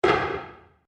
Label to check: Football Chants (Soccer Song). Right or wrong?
Wrong